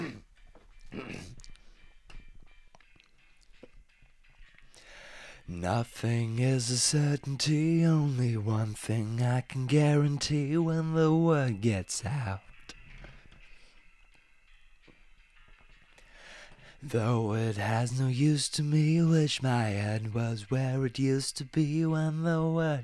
vox; logic

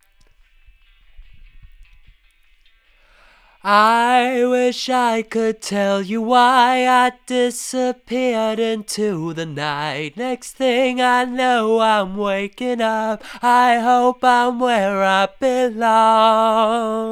vox

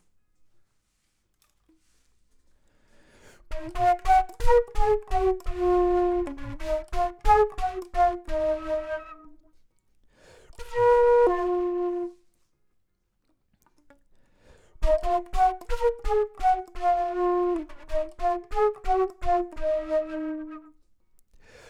flute; logic

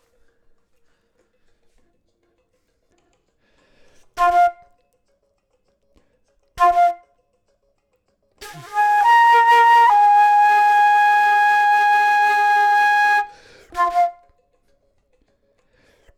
flute; logic

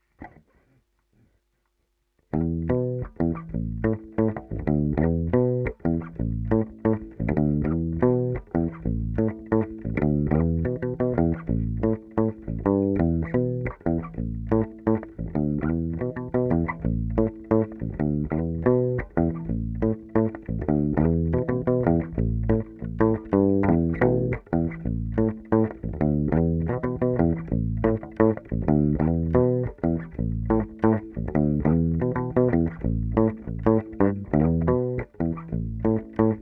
Hi-Fi DI

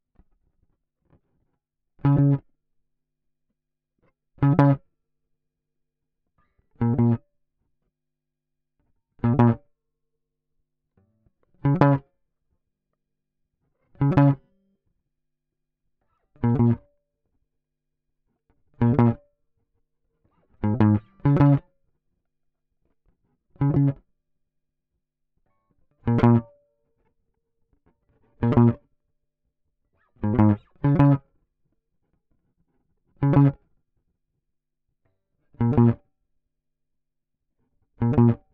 git pluck; logic

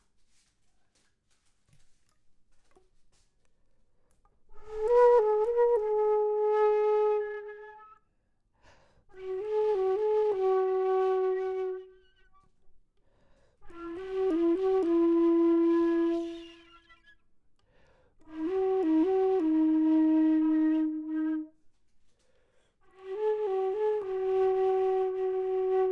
flute low; logic

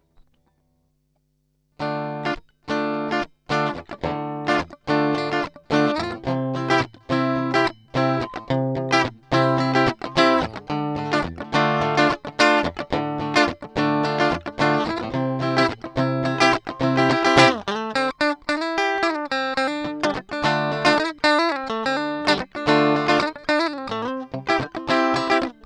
Amp

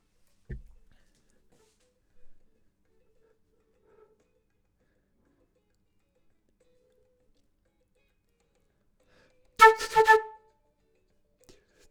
flute; logic

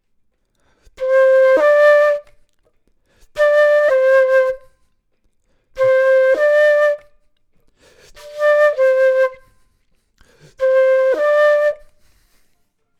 flute; logic

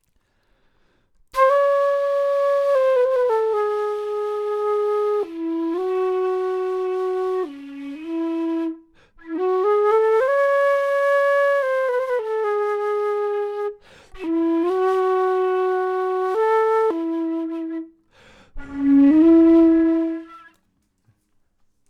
flute intro; logic

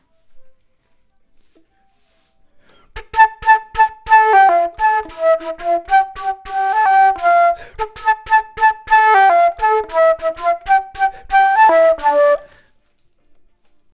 flute

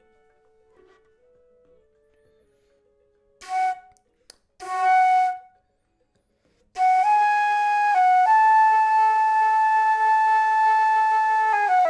flute; logic

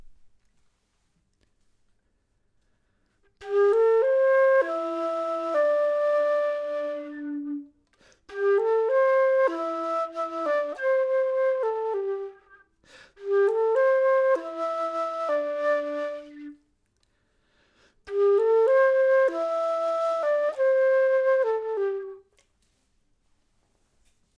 flute

